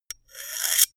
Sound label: scissors scrape